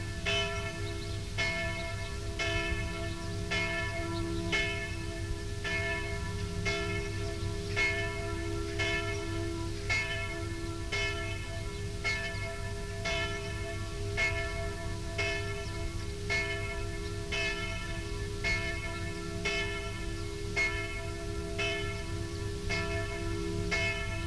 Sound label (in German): Glocke Brenndorf